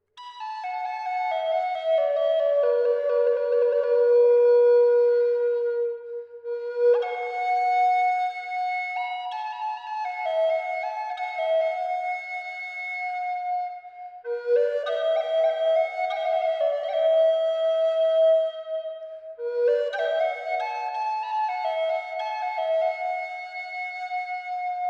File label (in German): Eibe B hoch 432 Hz